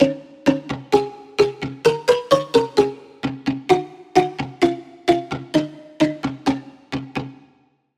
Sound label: Percussion; Routed Sound; Serum 2 Expansion - Story Walker Vol.1